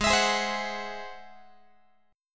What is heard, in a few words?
G sharp Augmented 7th G sharp Guitar; G#+7; strummed; x,16,19,18,16,17